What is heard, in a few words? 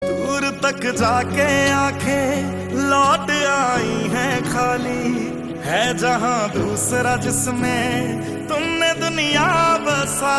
Ringtone